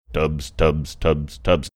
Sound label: singing tubs tubs tubs tubs